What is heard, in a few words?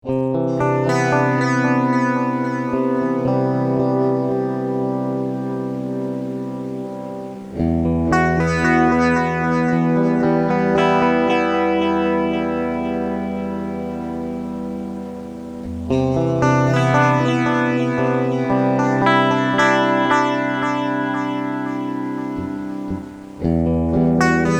VINT RACK